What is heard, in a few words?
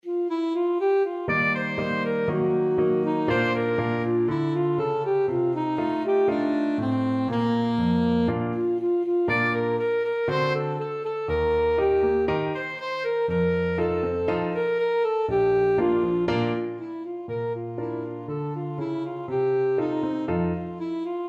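alto saxophone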